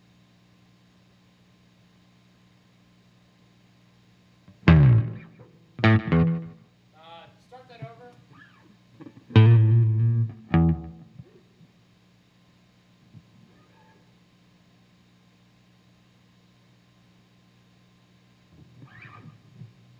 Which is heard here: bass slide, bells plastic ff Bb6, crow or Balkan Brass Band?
bass slide